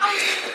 epic

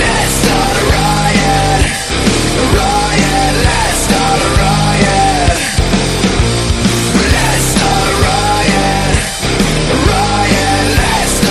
ringtone

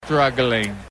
struggling short

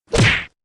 punching

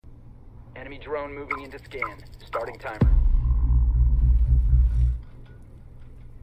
lion scan

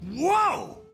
wwoahh

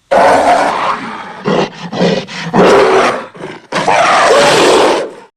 bass

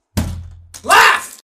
smack laugh; jacksepticeye

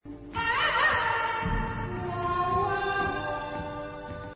ah wa wa waaa

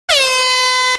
airhorn

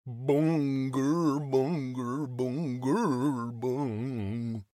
stunned

